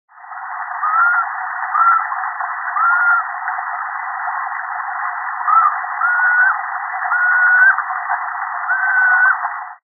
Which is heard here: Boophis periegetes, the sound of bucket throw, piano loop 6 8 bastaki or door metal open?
Boophis periegetes